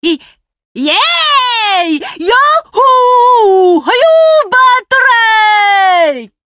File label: yay, yahoo; Beatrice